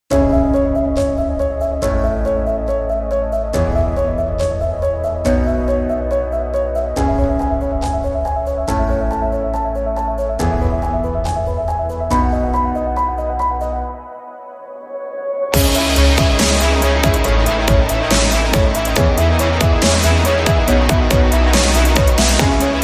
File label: Fire phoenix; Bootflare